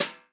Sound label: brush; Northstar - New Gold VOL-2; 50S BRUSH